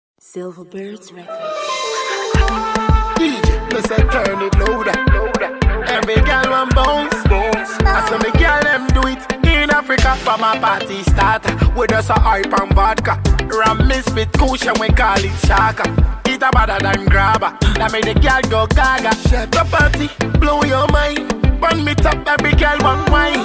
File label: Dancehall Riddim